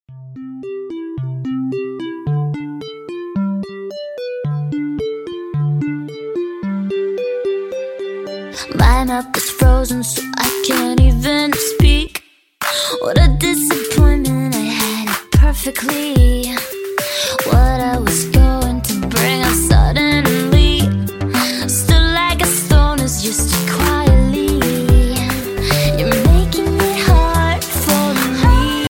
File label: ringtone; iphone 4s